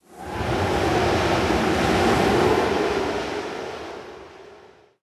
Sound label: Ice storm; Level10